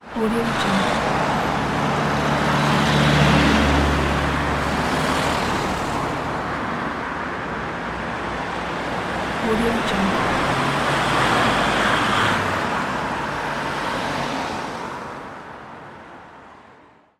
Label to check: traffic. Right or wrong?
Right